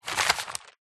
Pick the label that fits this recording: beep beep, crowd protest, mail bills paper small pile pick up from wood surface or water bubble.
mail bills paper small pile pick up from wood surface